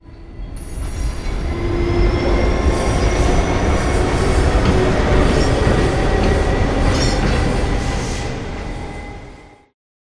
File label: approach, train crash